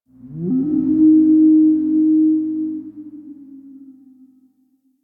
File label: Exterior Eerie; Warframe; Ambience; Duviri